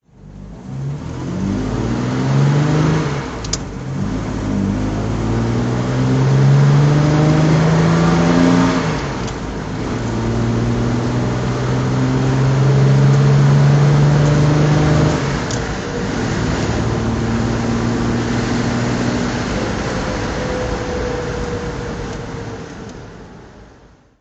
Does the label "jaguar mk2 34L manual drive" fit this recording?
Yes